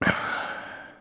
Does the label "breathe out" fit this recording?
Yes